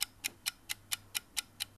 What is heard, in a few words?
ticktock